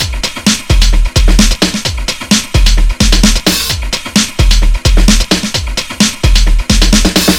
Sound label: breakbeat 130